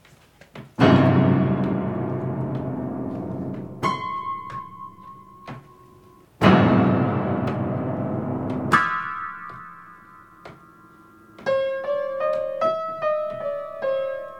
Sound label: Creepy Piano Music